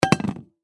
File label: Pot Set Down; Pot Stainless Steel 3 Quarts Set Down Wobble Wood Surface; Pots And Pans; Household; Blastwave